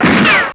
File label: shot; BESTSHOT; noisybit